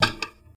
Radio Band Switch

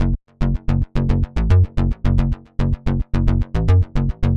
Bass

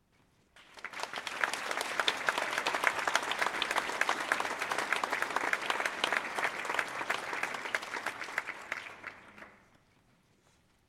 Polite applause

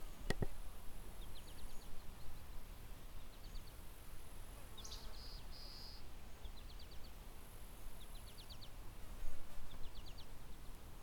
Prairie summer Ambience

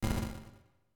Fail

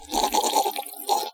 Sipping

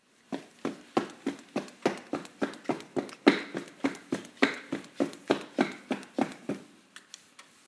Running On A Concrete Floor

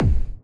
Brown noise splined kick

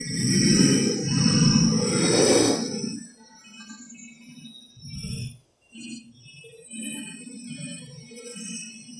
Bug

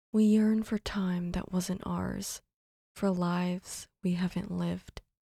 Female Vocals

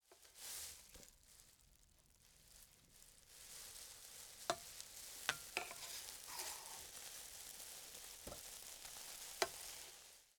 Pancake Flip

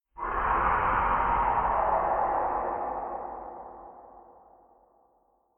Scary Horror Terror Shriek

